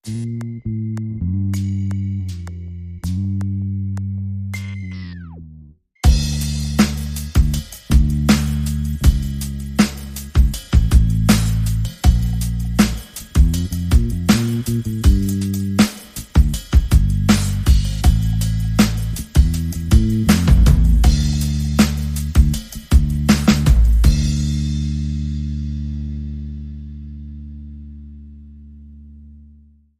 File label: Drum and Bass; 30sec